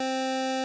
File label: fm nes like; Chiptune